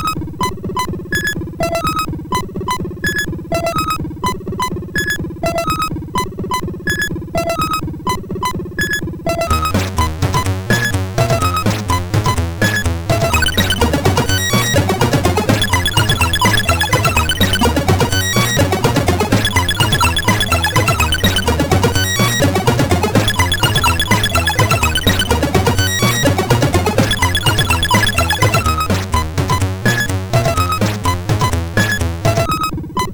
tune, sid, MOS6581R4

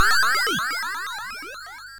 War Cars Construction Kit, MOS6581R3

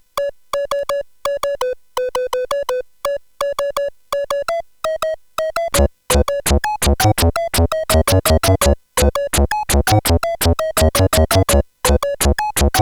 Flute Fun, CSG8580R5; HVSC

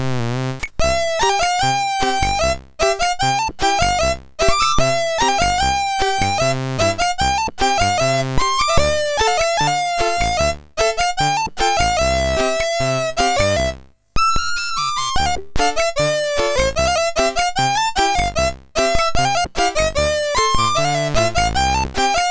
Nokia Ringtone Arabic, sid MOS6581R3

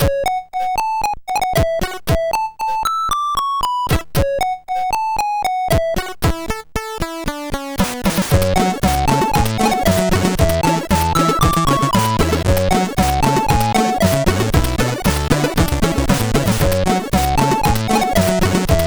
Medieval Party sid CSG8580R5

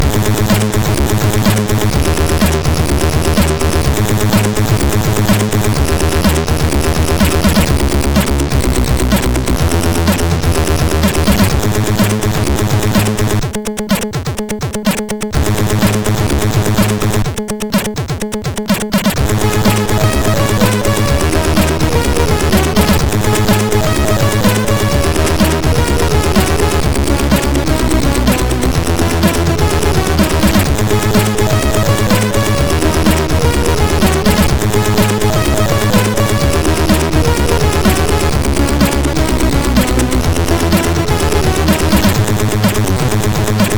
Plastic Age, MOS6581R3

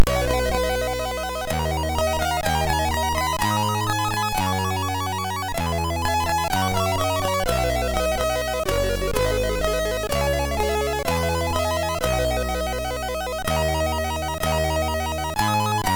Cover, sid; MOS6581R3; hvsc

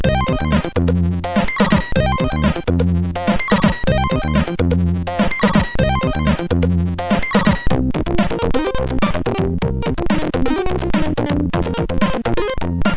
Chubby, MOS6581R4

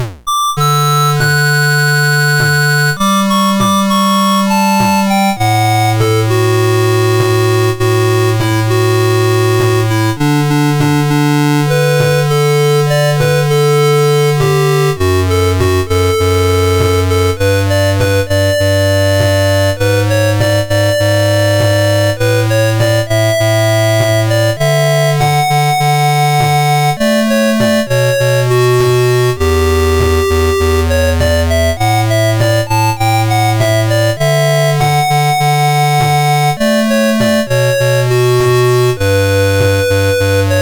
Theme, MOS6581R3; Suicidal Software

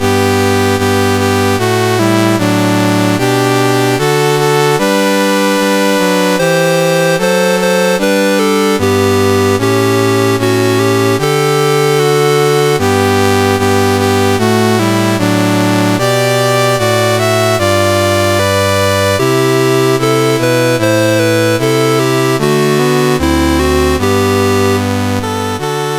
CSG8580R5, National Anthems; Sierra Leone; Dave Marquis

